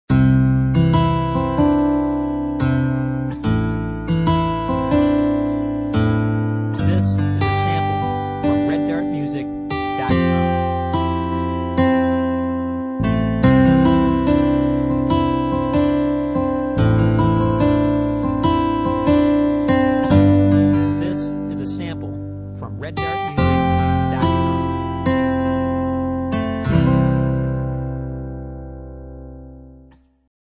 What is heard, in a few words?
Simple Guitar